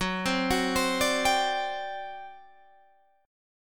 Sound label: G Major 7th Suspended 4th F sharp